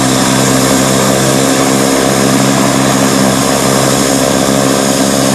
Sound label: Running, MQ-9; FGFS